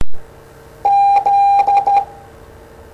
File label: Morse Z